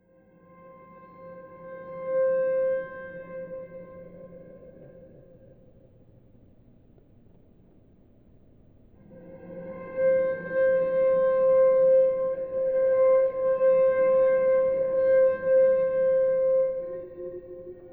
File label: ghostwind